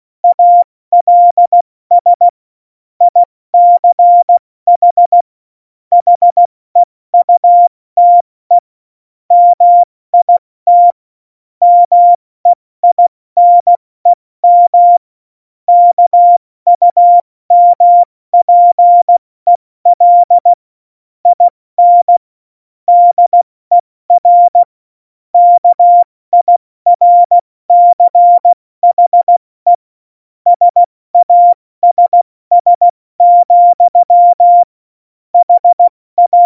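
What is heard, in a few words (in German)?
Witz, cw morsen; Morsekurs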